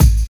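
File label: BASS DRUM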